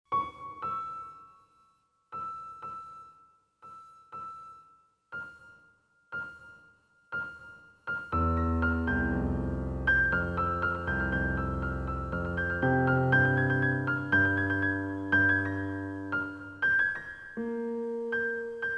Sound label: Piano